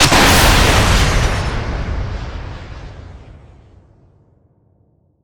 Weapons Missile Launch; DCS

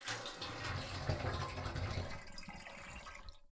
water jug fill container; Rust

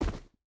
footstep rubber boots dirt jump land; Rust

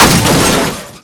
suburban crash impact; Call of Duty Modern Warfare; physics

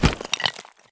bodydrop tile; Escape from Tarkov

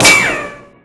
rico metal, ricochet; Modern Warfare

